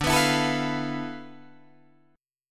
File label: D Minor 6th Add 9th, Guitar, DADGAD